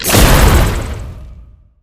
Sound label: blood explode; garrysmod